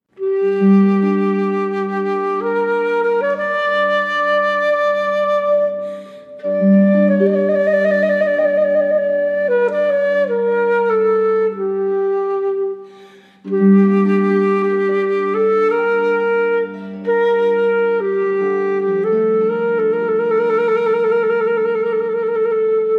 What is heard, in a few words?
KLEZMER